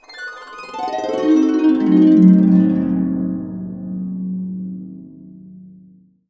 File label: C DIM7 CA DN, CASCADE DN, Complete Harps; USB Soundscan vol.62; AKAI